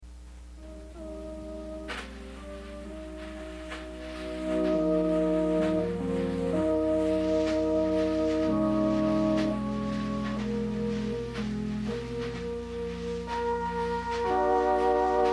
Vibraphonist

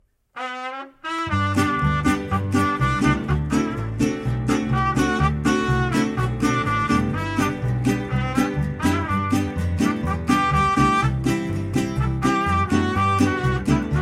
Mariachi; Oklahoma City

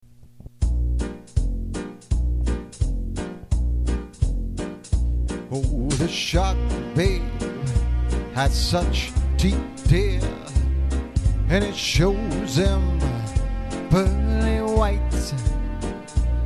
50s 60s Band

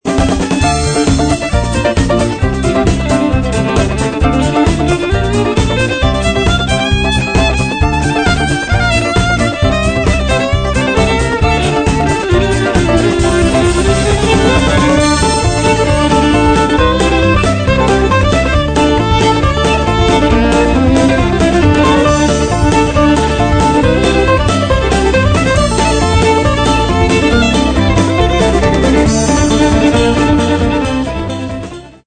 Irish Band

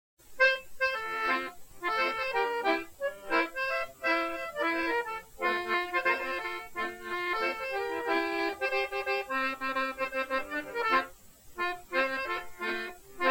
Italian Accordionist; Atlanta

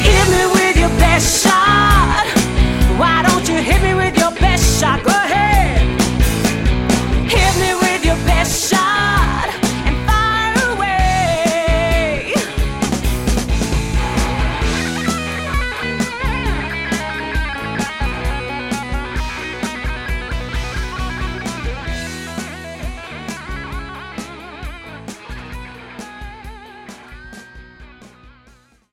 80s Band

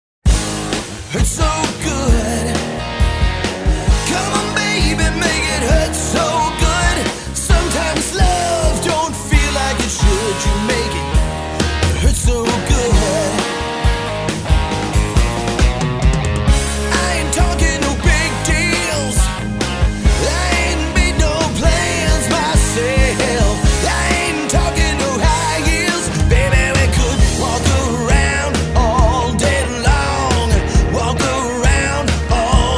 Cover Band

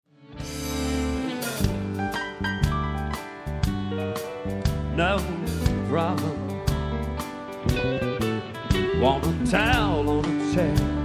Tribute Band

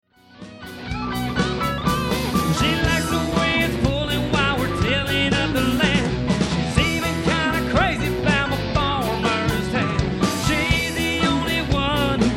Tribute Band

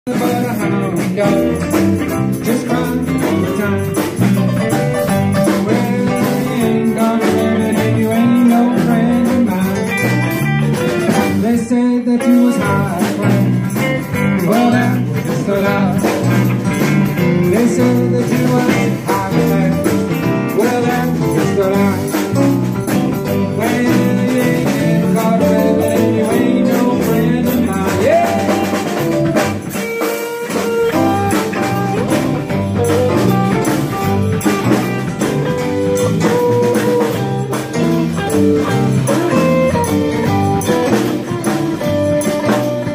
cover band

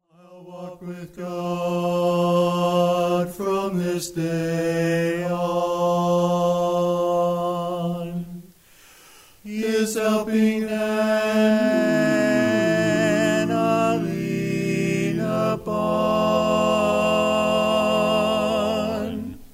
Barbershop Quartet; Toronto

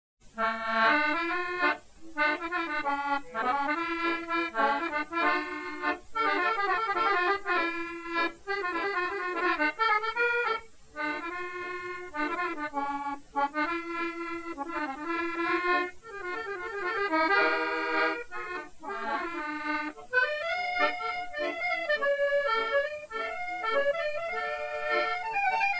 Italian Accordionist, Mazurka; Atlanta

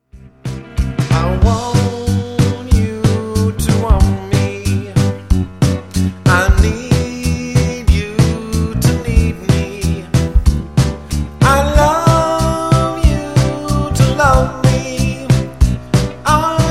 Cover Band; Omaha

